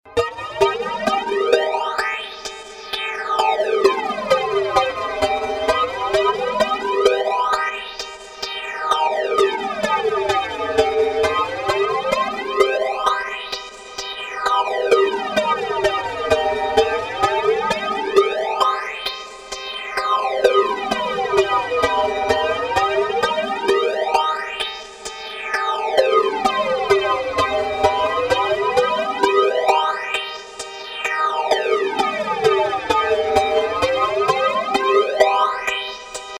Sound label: flangit